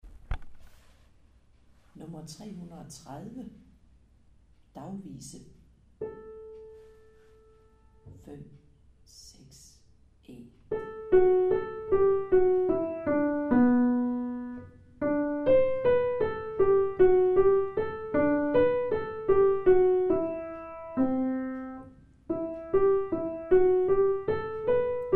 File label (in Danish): Dagvise, Melodistemme